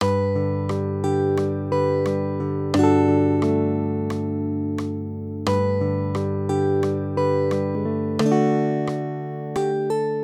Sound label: duet; 4/4